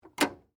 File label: ATM Door Open, Industry, Atm, Door, Open; Machinery; Machines; BlastWave